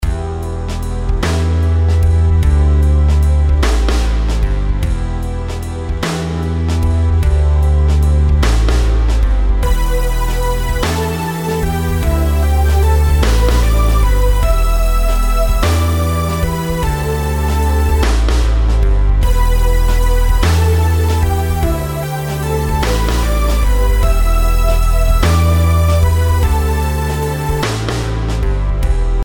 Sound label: rhythms compound 8s